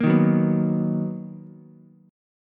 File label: B Major 7th B down Ukulele; strummed; JGuitar